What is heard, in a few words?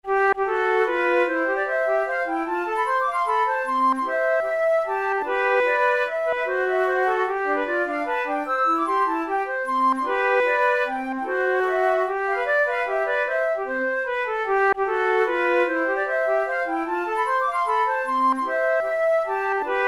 mazurka, flute tunes; chopin